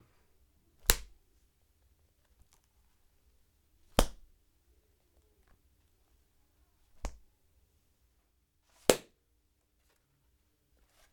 Book Closing Various

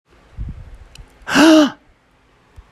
Sound Of Surprise; Sonido De Sorpresa

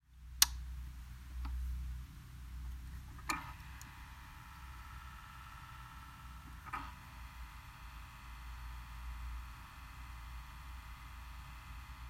Record Player Turning Table

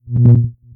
Woop Transition

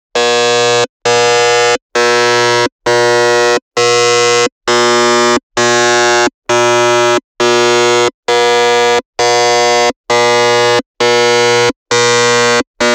American Game Buzzer Variants

